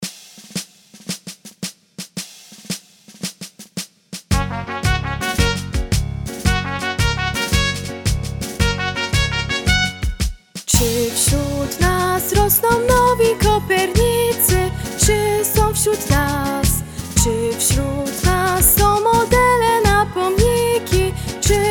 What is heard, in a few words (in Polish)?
Hymn